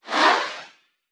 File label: notifications; Smartisan; Smartisan-OS-6.0.0